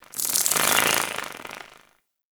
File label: Winged Insect Passby; Ambience; Warframe; The New War; Narmer Outpost